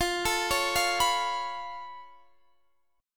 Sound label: C sharp Augmented 7th, F